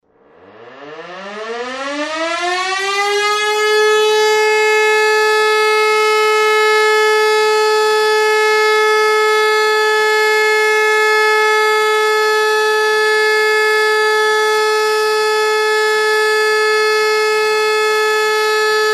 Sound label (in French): alarme; accident nucleaire